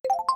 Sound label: send message